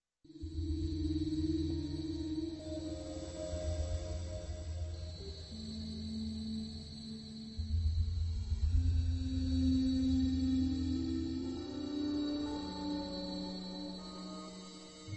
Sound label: Sound planets; star-rebellion